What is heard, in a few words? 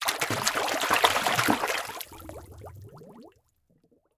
Liquid Water Water Splashing And Bubbles